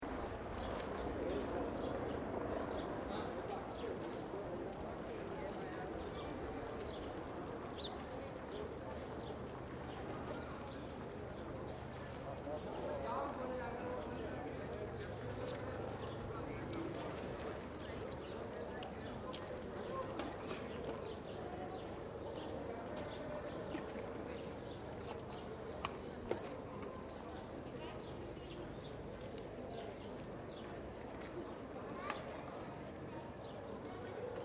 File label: City Large Crowded Street Spring Birds, Light crowded street with spring birds and Swedish voices; Children crying. Travel bag with wheels on cobblestone.; City Sound Effects